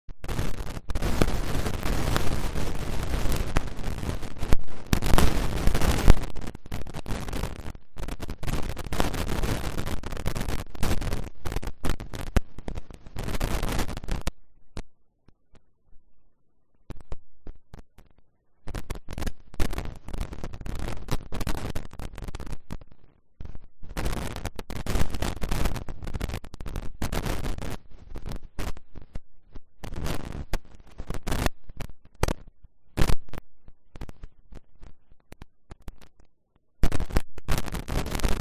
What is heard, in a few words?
riverside; Kyoto U; Ashiu